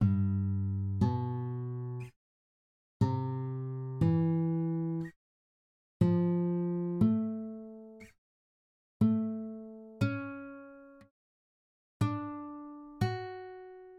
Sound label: major thirds exercise